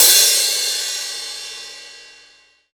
big crash sound c key, Royality free cymbal crash sound tuned to the C note